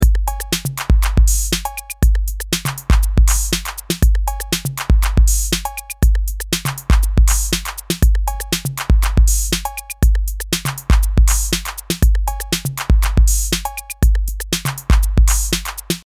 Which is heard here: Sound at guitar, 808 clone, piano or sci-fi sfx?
808 clone